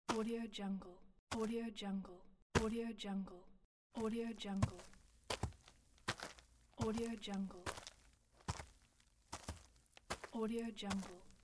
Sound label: footsteps on dirt running and walking